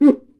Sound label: CUICA, Brazil Drum Perc; E-MU Producer Series Vol. 7 – Old World Instruments